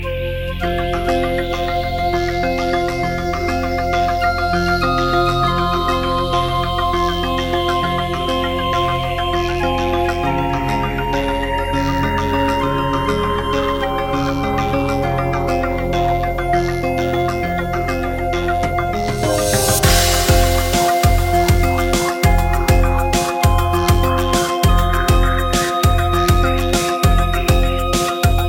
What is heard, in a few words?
Flute and Drums